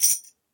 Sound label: TAMBOURINE